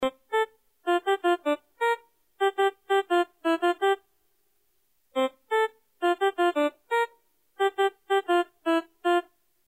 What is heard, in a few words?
fight song keyboard